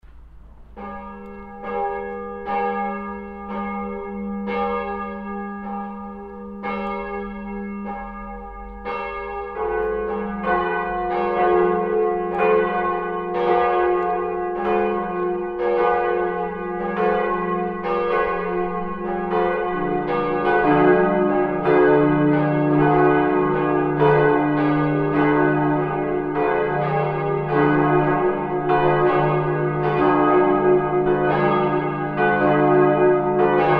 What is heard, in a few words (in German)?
Glocken; Te Deum; (zu Hochfesten im Jahreskreis) beginnt mit der dritten Glocke, die zweite und erste Glocke folgen; St. Lorenz